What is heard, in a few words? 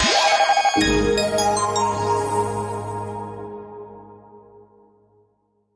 Sonic 4 Note Spark